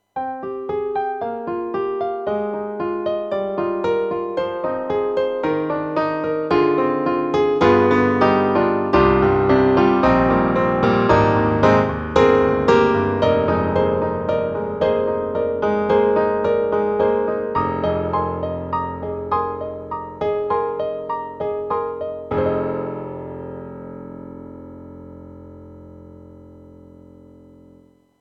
YAMAHA MU500 Con Grnd

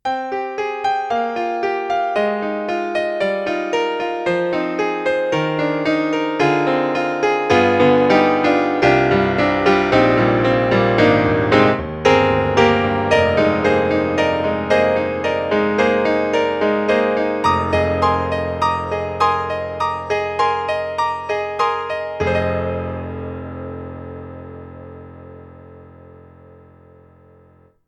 Kurzweil MicroPiano MP-1 Bright Piano

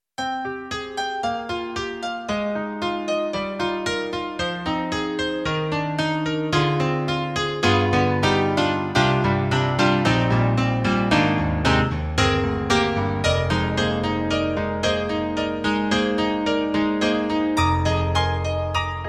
Roland SonicCell Back E-Grand

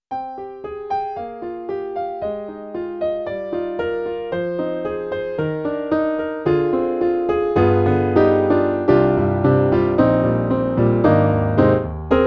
E-MU Holy Grail Expansion ROM, Studio

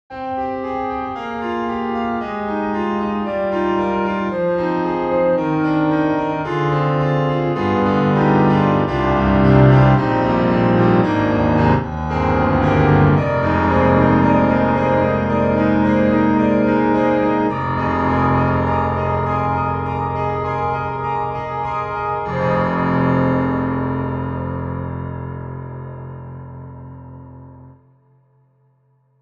Micropiano; Cakewalk; Rapture Expansion Pack 2; RGC Z Hawaii

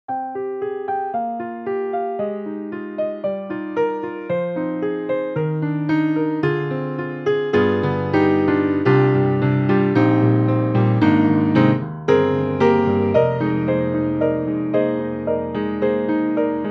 GRAND PIANO German Baby Grand; BEST SERVICE; GALAXY II GRAND PIANO; 1929

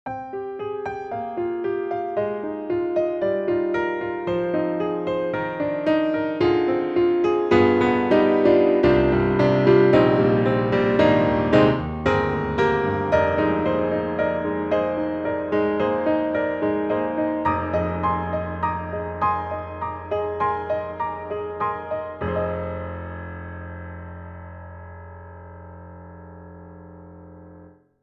Grand Pianos German D Concert Hall German Grand; Synthogy; IvoryII

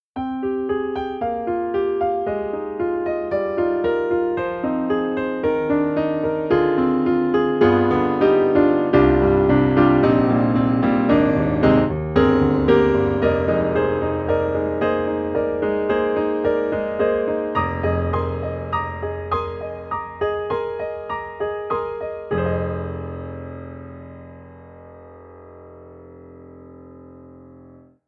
American Grand, Side Mic; VI Labs; True Keys

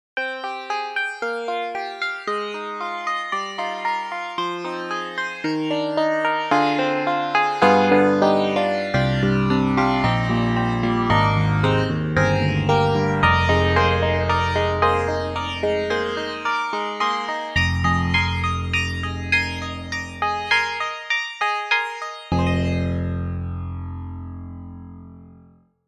Digital Grand Piano; Cakewalk; Rapture

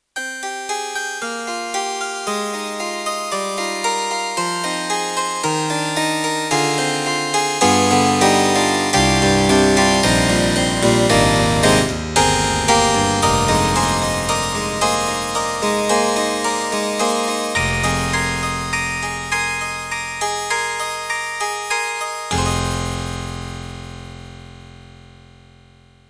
Yamaha MU1000EX Harpsi K

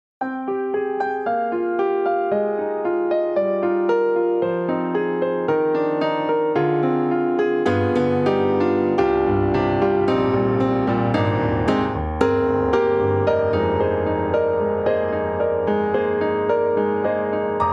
KORG KRONOS 2 Beautiful Piano

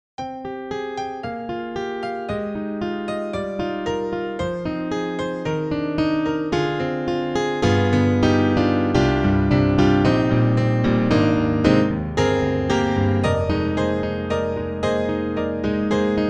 YAMAHA EX5, Jazz Grand

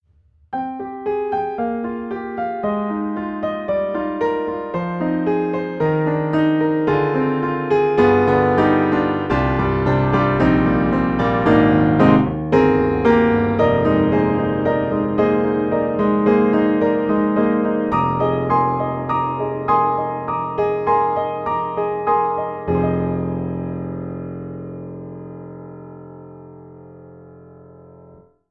Pianoteq D4 Cabaret; MODARTT S.A.S.

